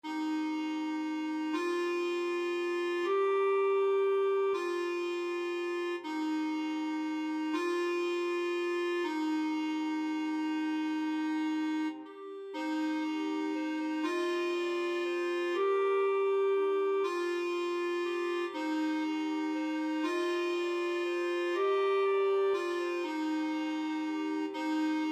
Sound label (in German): I wonder as I wander Sopran langsam